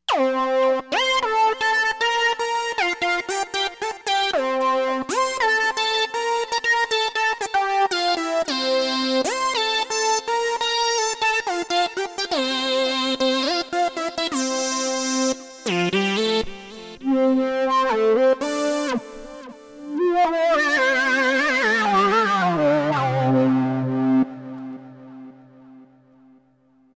Novation XioSynth 25

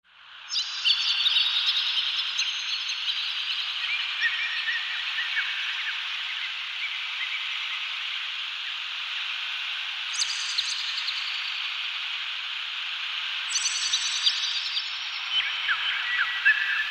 Rain Forest, Korg TR-Rack

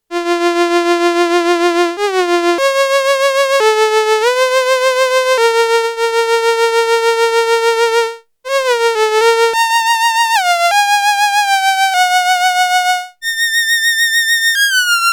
VIOLIN, Roland SH-101; Factory Patches

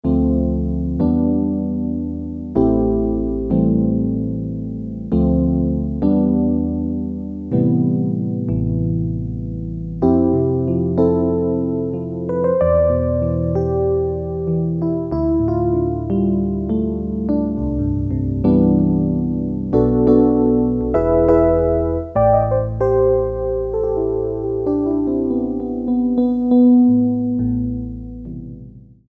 DX-100, Electric Piano; Korg DSS-1; Electric Piano 2

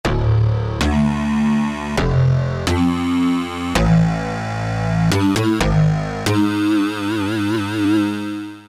Wave Bass, Rhythm Section Instruments; Roland L-CDX-01 Rhythm Section Instruments

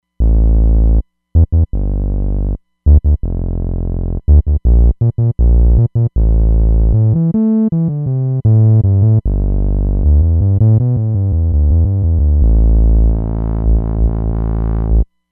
Virtual RnBs, Roland Fantom XR

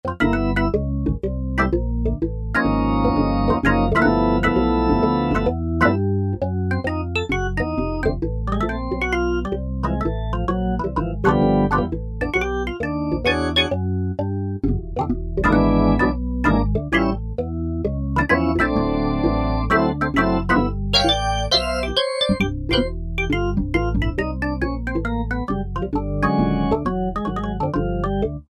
Jazz Organ, Roland JD-990; Factory Patches; перкуссионный орган

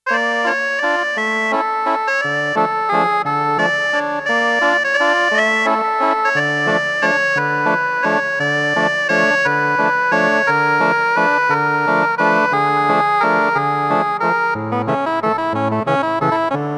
ACCORDION, Roland JX-1; Factory presets

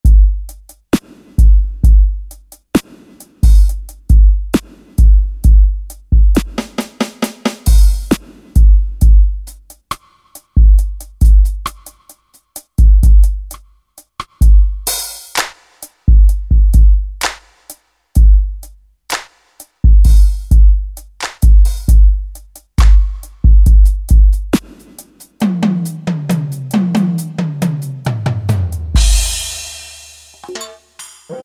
Drum Kits HYBRID; Generalmusic Equinox

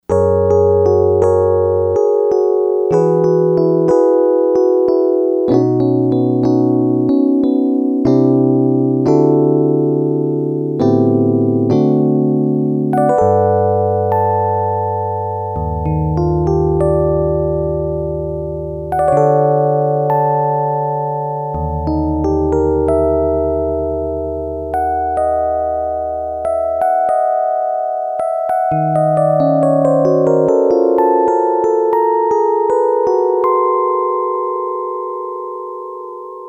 New Electro, Yamaha DX100; Factory Patches

